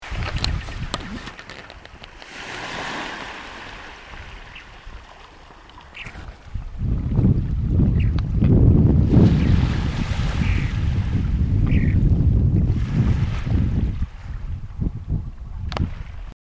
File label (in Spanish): quetro cabeza blanca, Tachyeres leucocephalus